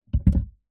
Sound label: plastic box empty place over microphone; foley; zapsplat